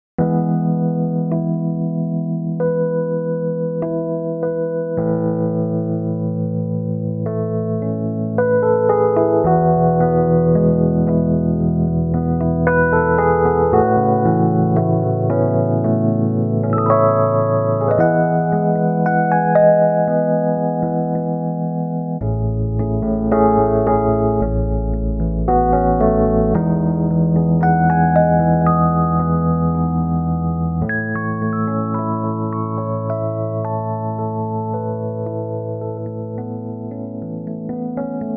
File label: electric 88 piano; waves